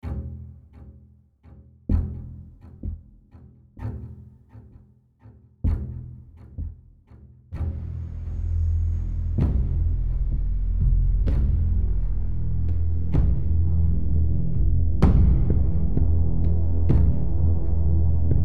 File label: Suspense Loop